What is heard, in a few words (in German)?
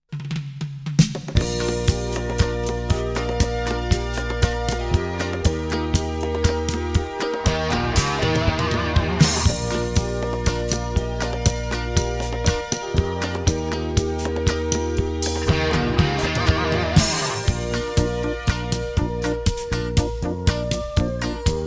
Karaoke